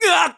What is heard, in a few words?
Hurt Bad; Skyward Sword